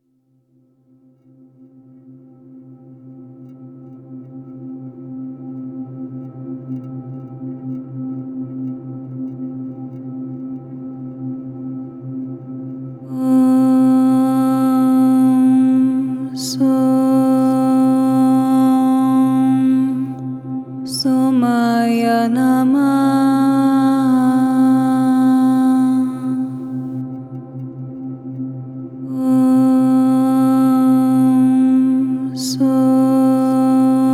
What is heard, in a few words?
OM SOM SOMAYA NAMAHA; mantras lunares